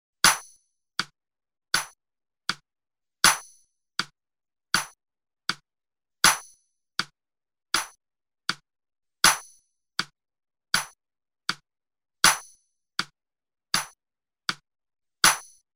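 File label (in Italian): esercizio ritmo quaternario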